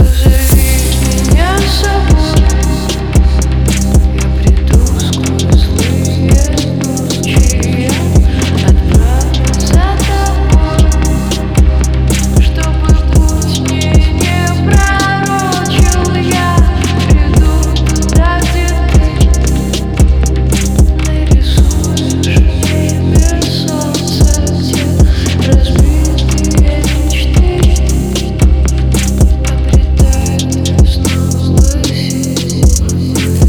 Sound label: slowed+reverb